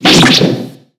Player Damage; BOMBERGIRL RAINBOW; Arcade

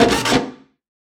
Deployment Patrol Assign

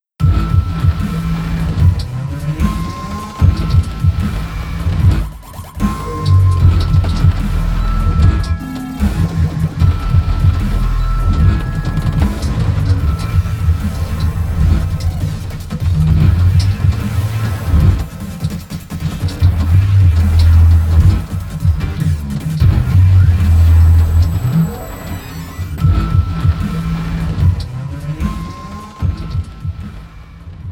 Gastropub Ambience; Zurkie's

